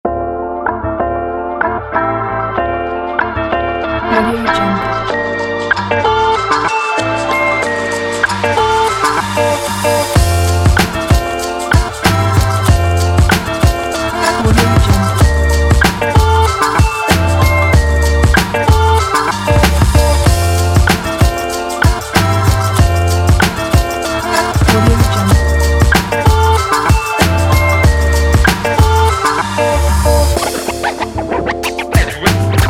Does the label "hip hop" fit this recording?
Yes